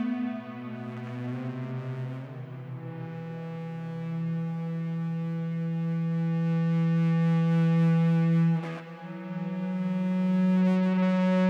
Processed Guitar, Loops; Guitars

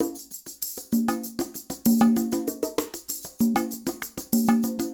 Acoustic Percussion

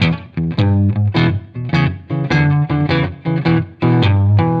Electric Guitar, Loops; Guitars

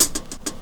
Old School Break, Drums

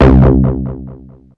BASS; SoundFactory; SMPMANIA

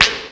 bleep; SoundFactory; FX

